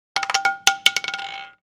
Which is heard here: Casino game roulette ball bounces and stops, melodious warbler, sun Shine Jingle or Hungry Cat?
Casino game roulette ball bounces and stops